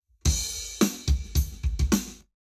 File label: Drums, 0deg; Lab Wet